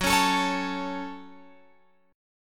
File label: Gb Minor, Guitar, Open F; strummed; x,13,13,16,16,16